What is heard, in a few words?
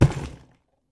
airdrop land